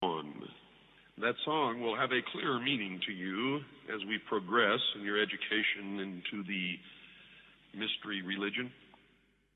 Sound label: Vocals; PixelJunk Shooter; PS3